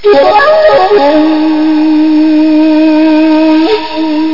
Pan Flute

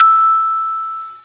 Celesta E6; Star Trek JR

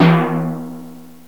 Timpani E; GMPlay; Drums; TG300B

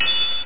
hihat; DRM PERC

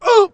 urghm

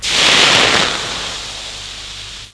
iron; Little Red Riding Hood

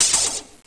Pulse Hit; BOOM

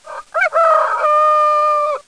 doodle

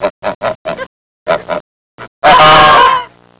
geese; Sound Effects for Multimedia - Volume 1; birds

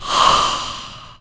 fog

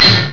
Get hit

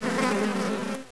beehive; sprites

